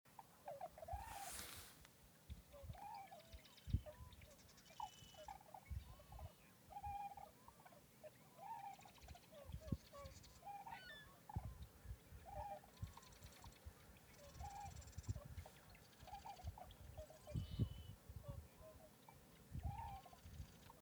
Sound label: bear river birds